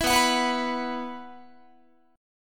B Suspended 4th, Guitar; Bsus4; strummed; 19,19,21,21,22,22

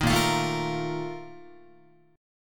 A sharp Major 7th Suspended 4th, Guitar; strummed

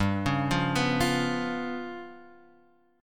G 7th Flat 5th Guitar Open F, G7b5 chord; arpeggiated

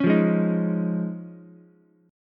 D sharp 7th Suspended 2nd Suspended 4th, Ukulele; D#7sus2sus4 strummed; JGuitar

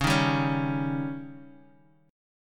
Db Suspended 2nd Flat 5th

